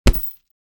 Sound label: Boxing glove hitting punching bag